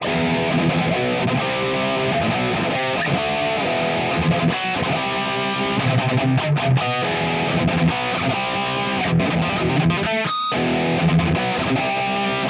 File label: charger rhythm; podxt; jcm